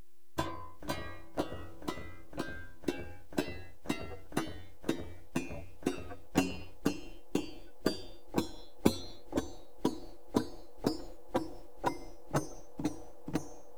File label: scale without strings